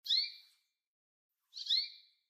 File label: american goldfinch